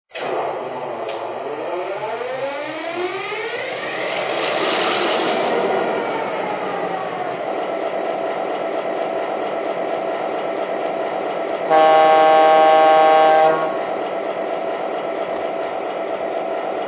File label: f3, trains; b&o